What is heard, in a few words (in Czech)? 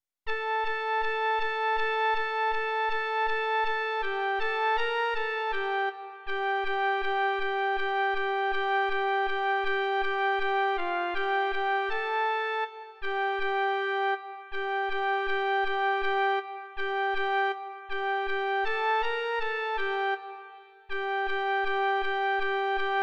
Když Pán Ježíš - jen melodie; Mužská verze; Doprovody Farského liturgie